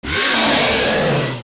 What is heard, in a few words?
Hit; Ganon; zelda; OOT